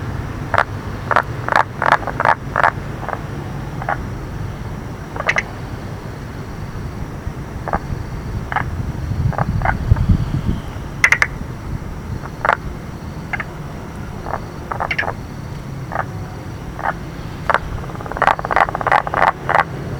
Polypedates leucomystax

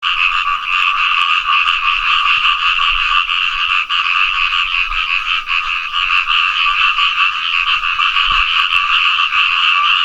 Fejervarya limnocharis

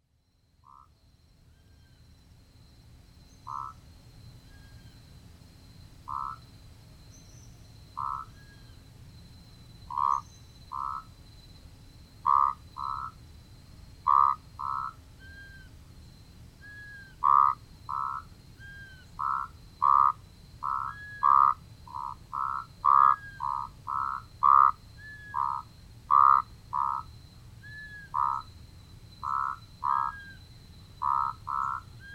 Proceratophrys moratoi

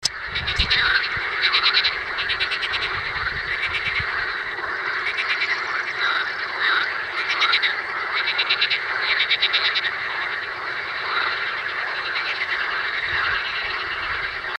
Pelophylax ridibundus

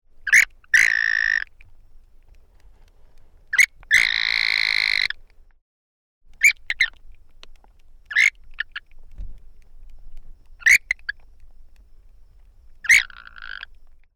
Anaxyrus punctatus

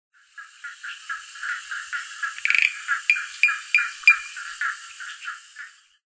Spinomantis fimbriatus

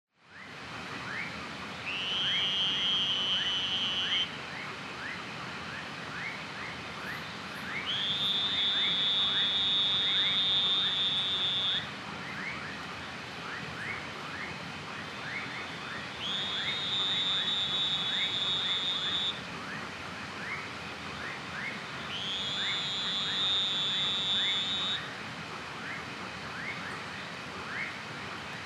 Elachistocleis bicolor

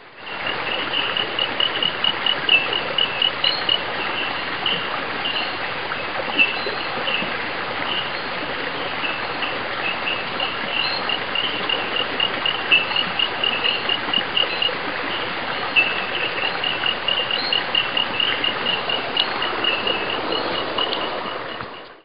Isthmohyla rivularis

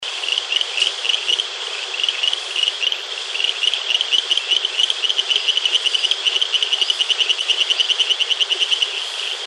Boophis septentrionalis

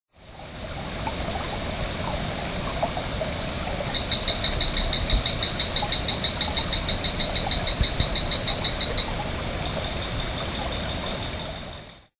Gephyromantis leucocephalus

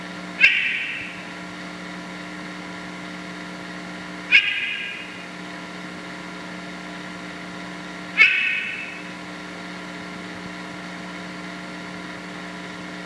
Dendropsophus padreluna

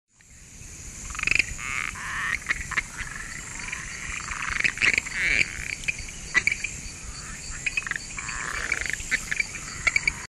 Boophis idae

